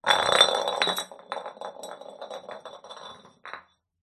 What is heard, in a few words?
pub beer bottle roll concrete; field reports